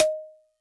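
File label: Xylo Snare DX100 D sharp 1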